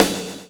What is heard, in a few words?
PULL; Spectrasonics - Backbeat